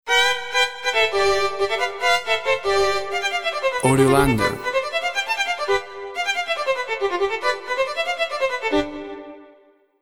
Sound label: Irish carnaval